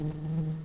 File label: Lapidarius workers, bumblebees